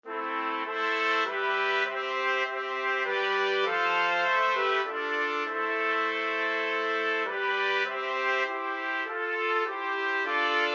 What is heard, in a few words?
trumpet quartet